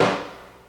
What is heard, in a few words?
latin snare drum b key